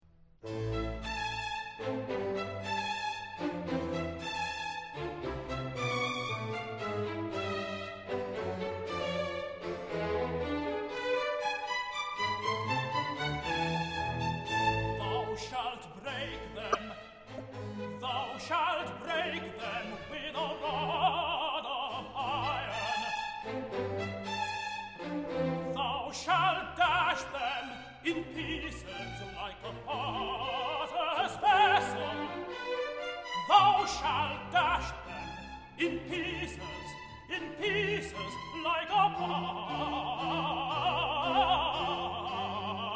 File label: Toronto Symphony Orchestra; Messiah - Handel